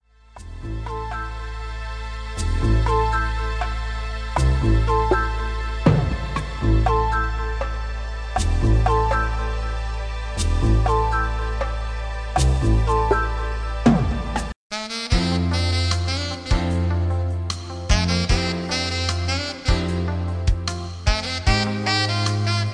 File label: SLOW BG